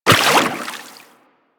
Walk Water, Footsteps; Warframe; Operator

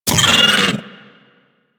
bug vocal pain; Warframe; Hunting Critters; Inf K Drive Bug

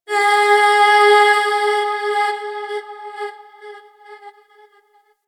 Opera Note; Warframe; Ambience; Orokin Moon; Moon Int Opera House

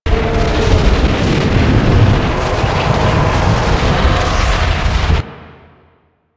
Void Dragon Floor Lava Pillar Start Center Loop; Enemies; Warframe; Duviri

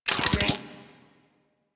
spear gun charge up; Warframe; Weapons; Corpus

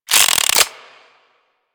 Revolver Pistol Reload Chamber

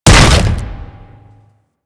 Hammer Impact Wood B; Warframe; Weapons

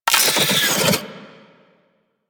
Wall Eye Detatch

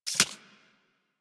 Arachnoid Micro Step Snow; Warframe; Corpus

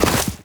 Run Deep Snow, Footsteps; Warframe; Operator

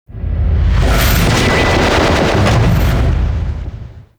distant mechanics; Warframe; Corpus Railjack; Ambience

